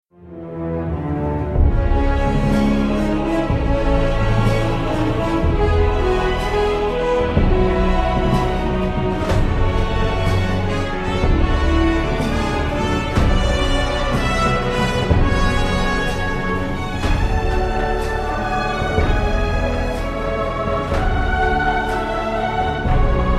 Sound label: login window, bgm